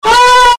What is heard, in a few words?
Bell